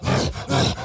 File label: Enraged Breathing Loop; The Town; Burns